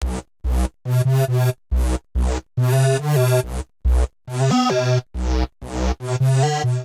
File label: Attack C Major 140 bpm, Synth; MIDI Clips; Tonal; PVM; Ableton; Skitter and Step